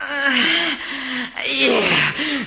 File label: "uh huh yeah", male orgasm; ooh ahh; uh um